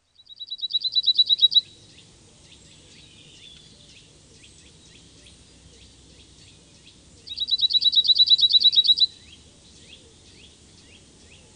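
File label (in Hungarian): Sövénysármány; Madarak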